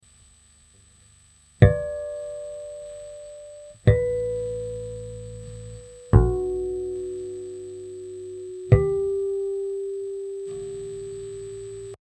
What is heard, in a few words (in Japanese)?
ハーモニクス